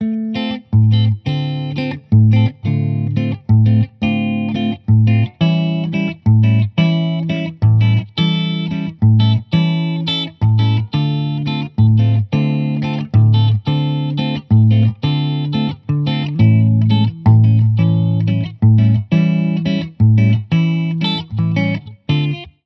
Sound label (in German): e gitarre clean, Shure SM57